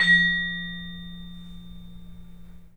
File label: vibes; 3D Sound; 3D Keys VOL-1